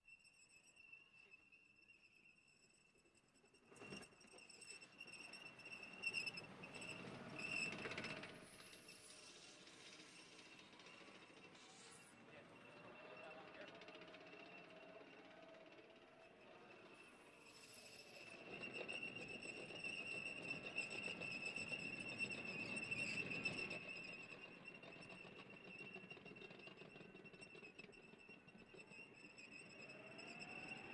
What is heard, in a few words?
Military vehicles; Valentino Production Sound Effects Library CD50